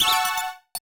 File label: PLAY TRAMPOLIN